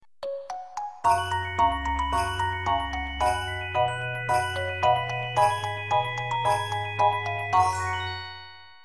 Station; Shinjuku

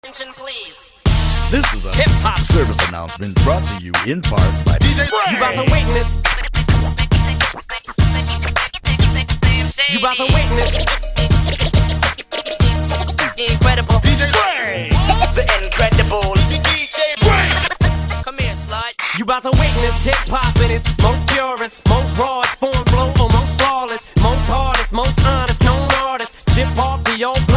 Hiphop

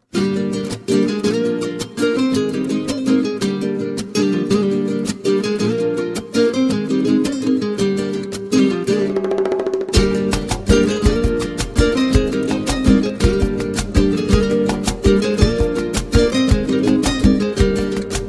Guitar Song Irani

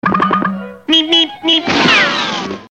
Beepbeep; Road Runner

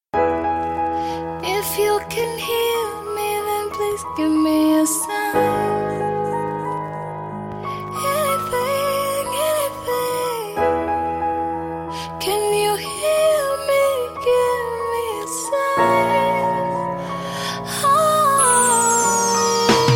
Arpegios

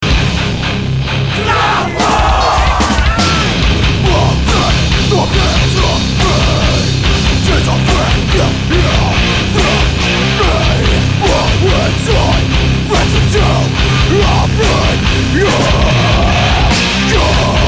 Crowd War

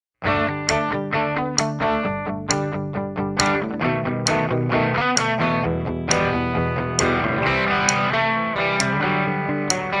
Pleasant Sms Tone

